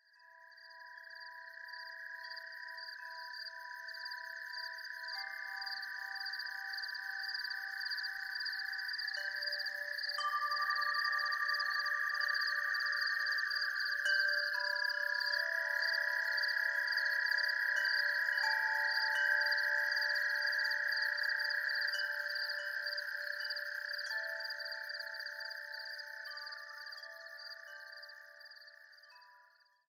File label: energy chimes; sound oasis